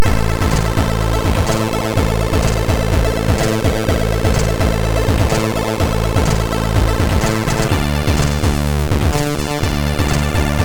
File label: Check Sound sid MOS6581R3; hvsc